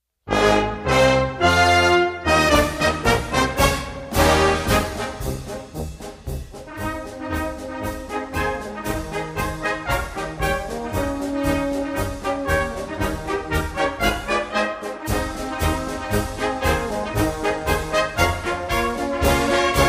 Feuerwehr Festmarsch

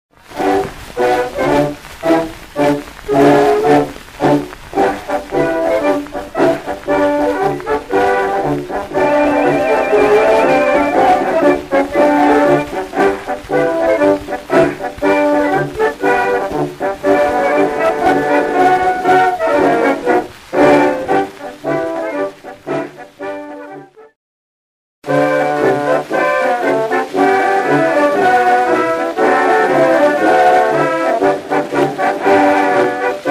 Marsch

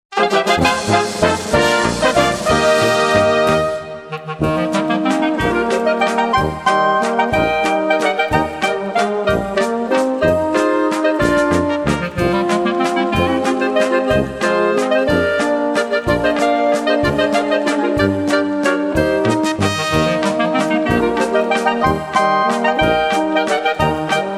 Klarinettenperlen

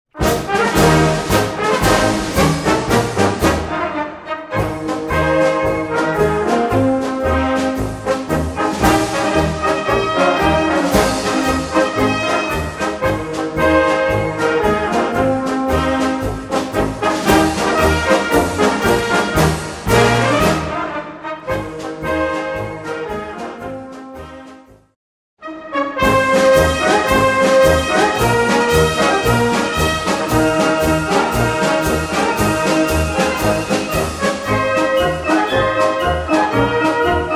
Liedermarsch

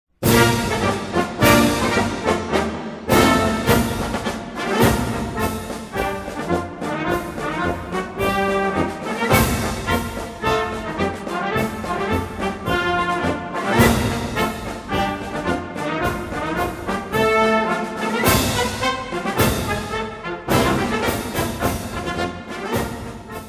Marsch

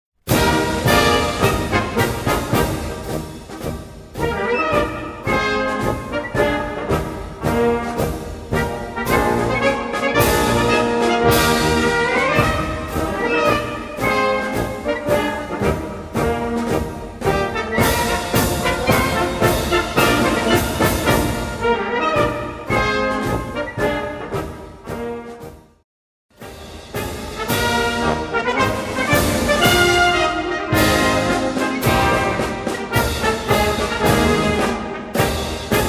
Marsch

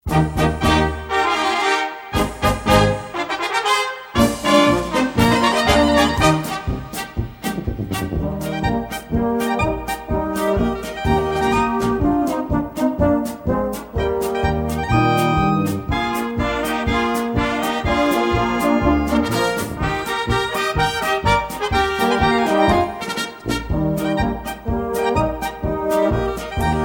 Polka

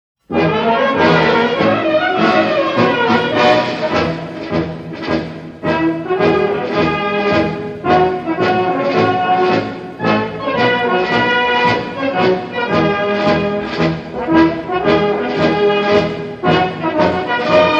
MARSCH

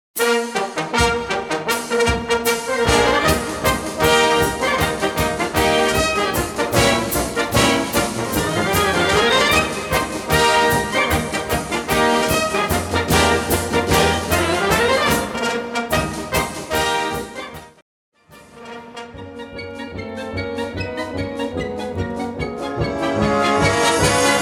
Galopp

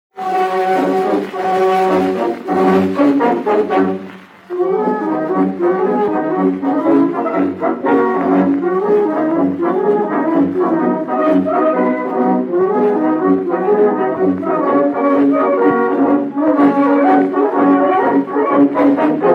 JAEGERMARSCH

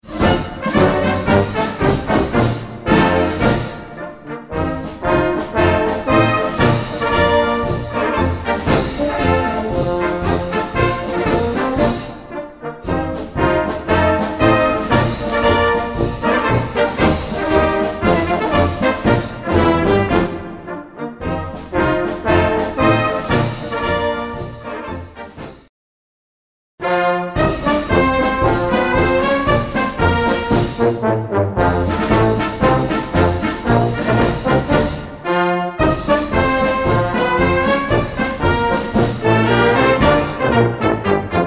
MARSCH

